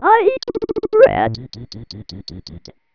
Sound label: burping